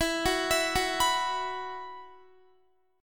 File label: E Suspended 2nd, Guitar; arpeggiated; Esus2; x,19,18,21,18,19; 4F,4A,5C,5G,5C,6E